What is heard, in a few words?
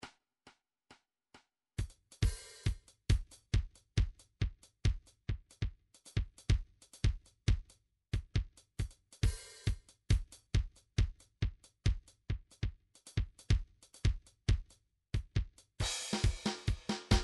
drum trax jazz 137